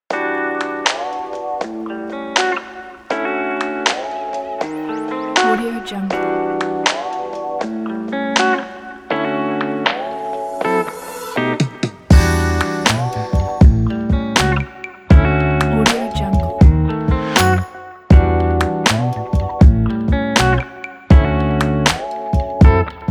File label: rnb soul type beat